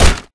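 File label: impact bullet light ballistic android; Titanfall 2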